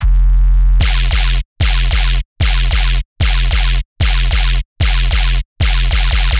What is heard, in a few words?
Bass Loop F 150BPM; Dubstep; Seraphim; Ghosthack; Advent Calendar 2022